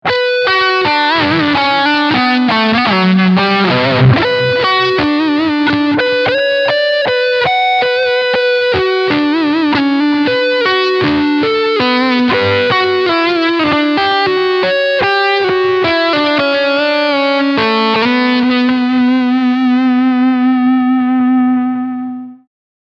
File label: Front Humbucker Lead